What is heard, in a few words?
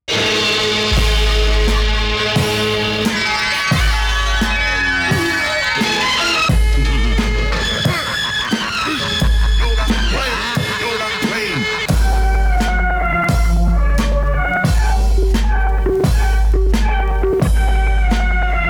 instrumental